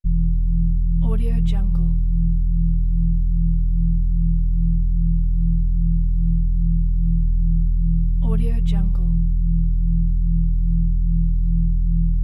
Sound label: dark ambience; umcsound3